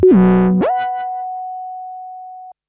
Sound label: BASS Wheel bass